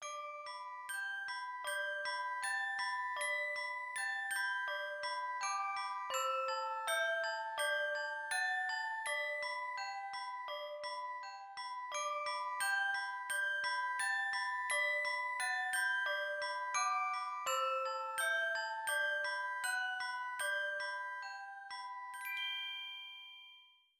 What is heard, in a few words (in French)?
Boite Musique